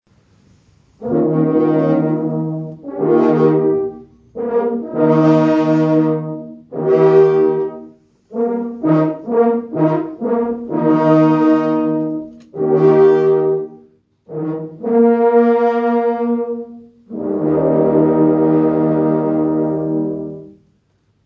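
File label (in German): Jagdhornbläsergruppe Hallali zum Todengedenken; St. Andrä Afers; Hegeschau Brixen 2023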